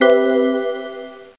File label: click